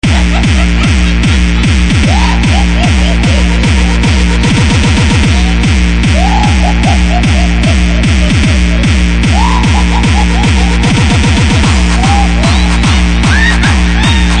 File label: hardcore